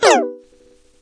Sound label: Hurt; super minipix; android